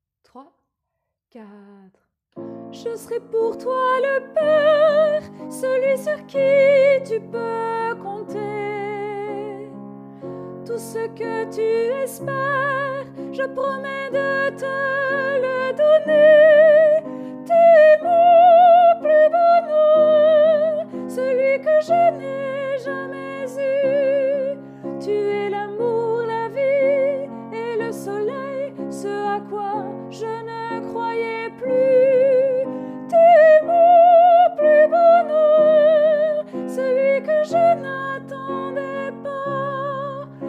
fin tenor

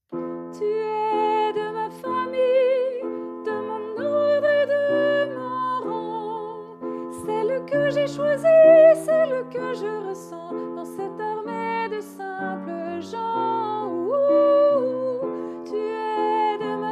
dernier refrain basses

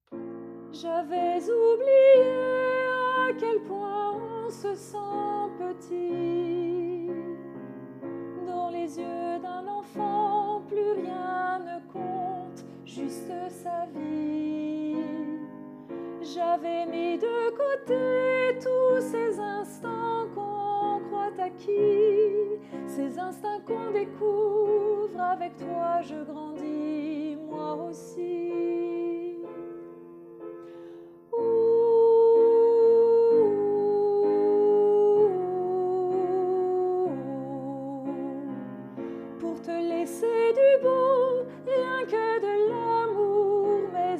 basse, chorale

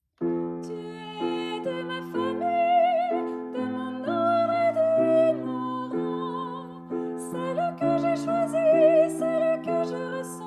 dernier refrain tenors

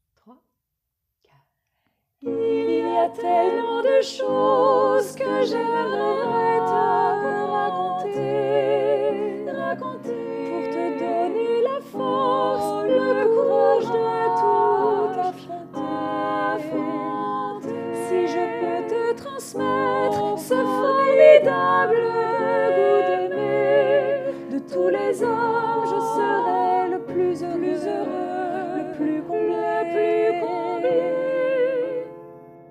couplet 2 tutti

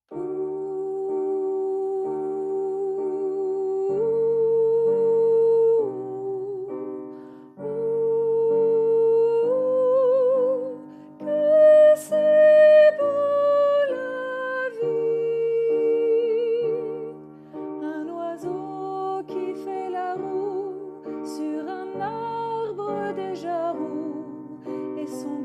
c'est beau debut soprane

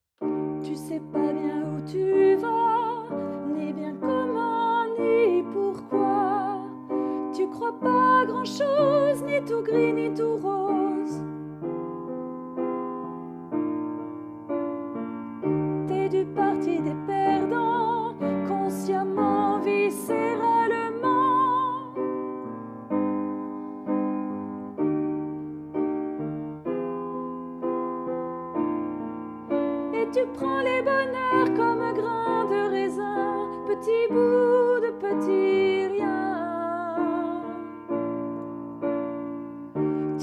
Famille basse

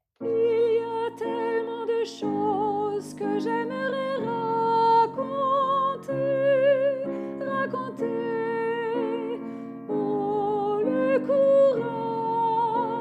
couplet 2 soprane, chorale

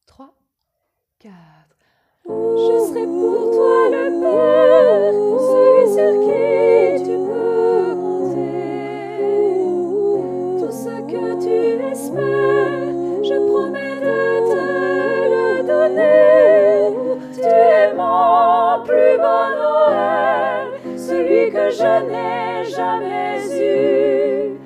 fin tutti

